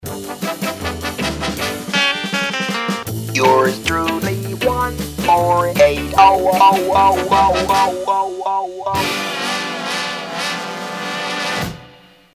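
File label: Funny Bone Signature - Instrumental, jingle; WABB; (Instrumental redo of Signature)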